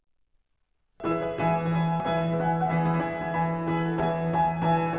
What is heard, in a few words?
orchestra